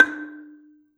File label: Gambang Hard Beater, Gamelan